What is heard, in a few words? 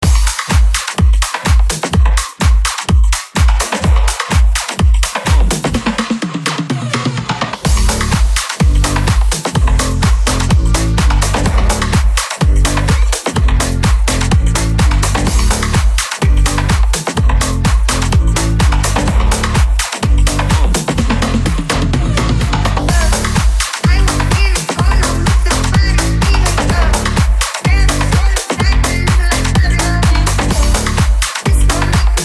126 bpm